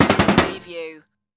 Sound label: Bullet Machine Gun Impact Metal